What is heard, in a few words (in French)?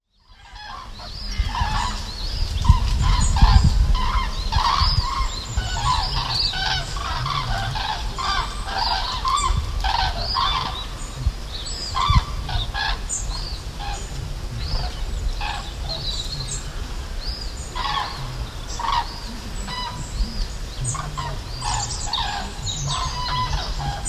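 le retour des grues cendrées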